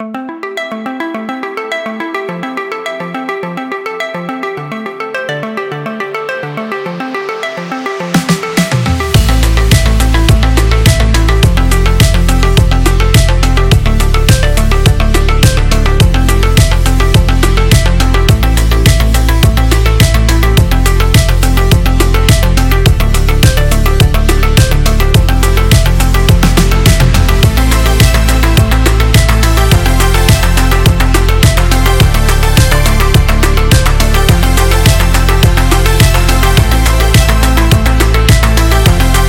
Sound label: Synthwave